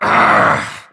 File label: vce get up